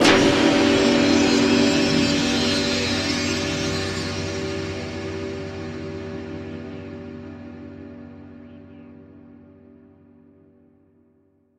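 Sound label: Production Element Hit Spirit; Sound Effects Bible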